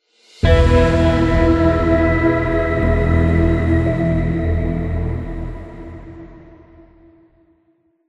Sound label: Stinger; Fortnite Save the World; Rocket Room